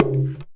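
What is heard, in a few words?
small tama hit; Best Service; World Colours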